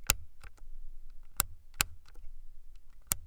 BOUTON SELECTION AUTOFOCUS

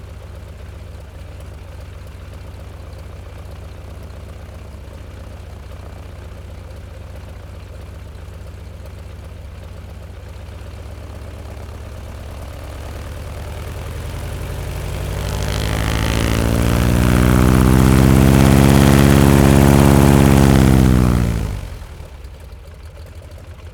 ACCELERATION SIDE

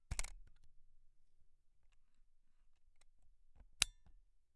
OUVERTURE-FERMETURE PELLICULE

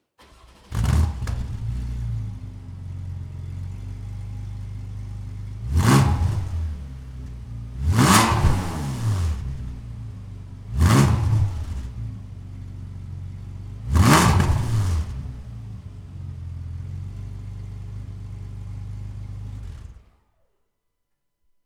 ACCELERATIONS

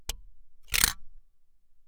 OUVERTURE VISEUR

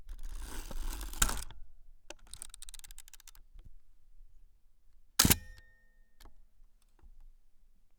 ARMEMENT ET DECLENCHEMENT MAMIYA RZ67

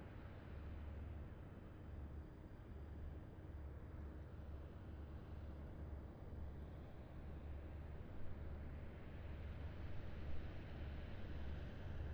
PASSAGE RASE-MOTTE

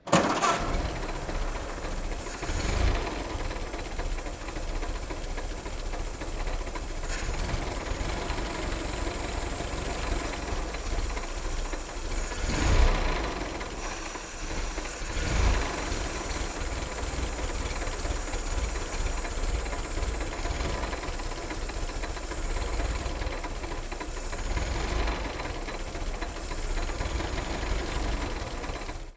DEMARRAGE ET RALENTI

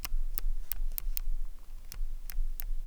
SELECTION MODE

